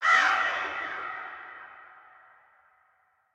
activate; smite; odin

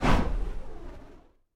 wing; Smite; god Horus

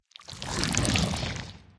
slither long; smite; scylla

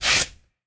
scuff; smite; gilgamesh

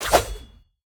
scorpion attack spear; smite; npc

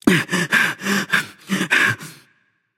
nv breath; smite; xbalanque

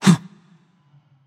grunt jump; smite; persephone

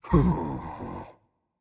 sigh, voicepack; smite; jorm

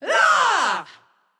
battlecry; smite; skadi

